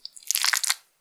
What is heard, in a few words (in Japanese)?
挿入or引き抜かれ終わる直前 普通; 挿入or引き抜く